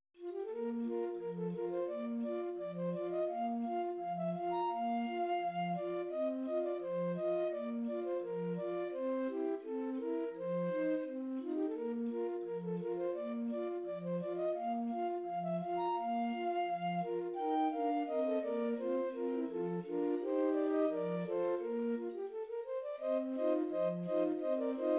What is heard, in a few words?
american patrol, orgues barbarie